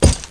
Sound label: wood